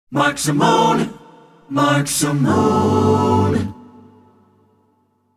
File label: Jingle; Short Attention Jingle Show IV